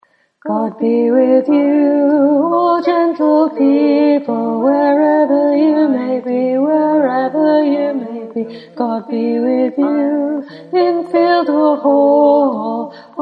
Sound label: God Be With You middle, choir